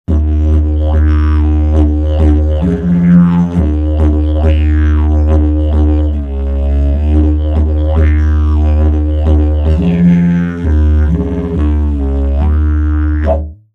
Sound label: didge